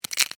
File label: Insect Claw Crunch, Snap, Movement, Bug; Animal